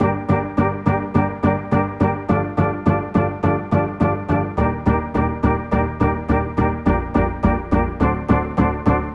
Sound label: Steel Drum 105bpm Gm